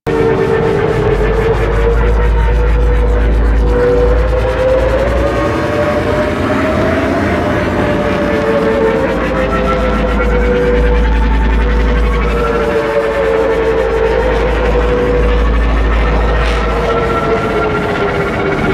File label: Drop Ship Engine Loop; Warframe; Corpus